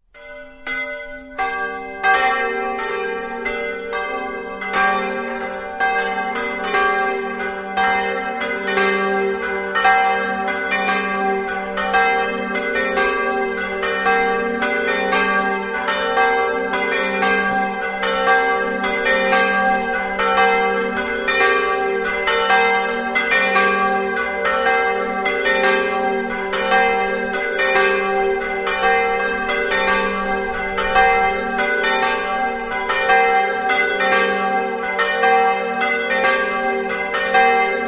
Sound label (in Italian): driolassa, campane